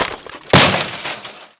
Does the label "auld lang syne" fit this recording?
No